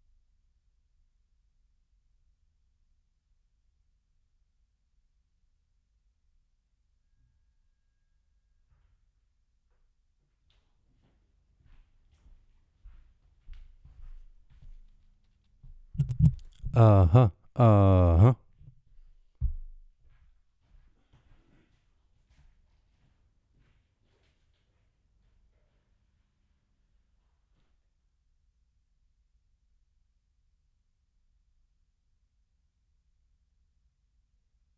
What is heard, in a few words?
TENOR SAX D